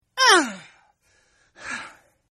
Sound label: Grunt; Grunts; Voice; Partners In Rhyme